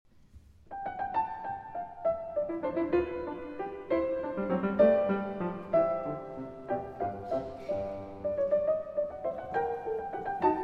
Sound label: Piano Sonata No. 3 in C Major; Op. 2 No. 3; Beethoven